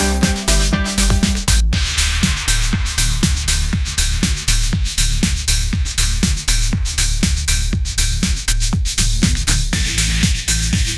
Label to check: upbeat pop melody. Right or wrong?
Right